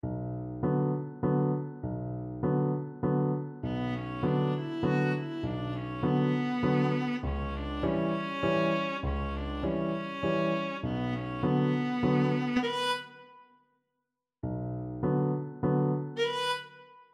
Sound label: creak crunch scream, viola